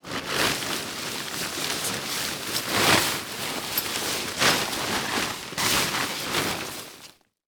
Tent Unfolding On Dirt; Sports

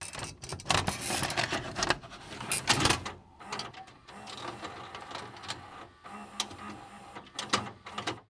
Technology Electronic VCR Put Tape In

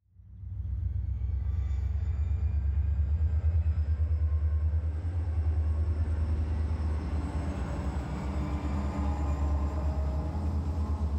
Ambience Train Pass By; AdobeCC

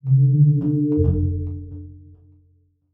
Transition Musical Tone Drone; Production Element

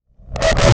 Transition Digital Distortion; Production Element Title Transition

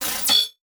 Multimedia Internet CD-Rom Flash Hi-Tech

